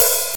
clicky open hi hat sample b key, Royality free open hat tuned to the B note.